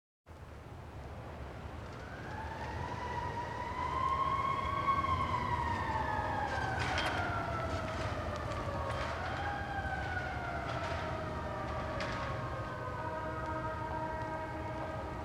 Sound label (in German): polizeiauto im verkehr; Großstadtgeraeusche